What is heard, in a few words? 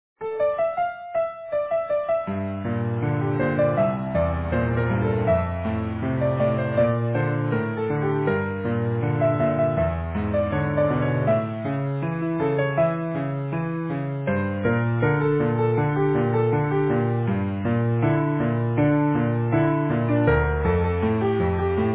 Easy Piano